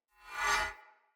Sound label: Great Sword Whoosh Accent; Weapons; Player; Mendicant Great Sword